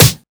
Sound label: Snr; Snr Bogie; Drum Hits; Snares; Nu Dance Electro